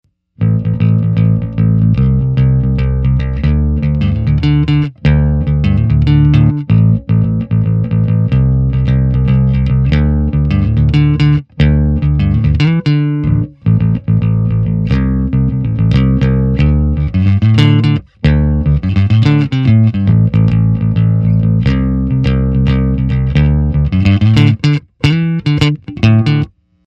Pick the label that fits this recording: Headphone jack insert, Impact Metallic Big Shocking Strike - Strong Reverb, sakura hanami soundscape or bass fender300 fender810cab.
bass fender300 fender810cab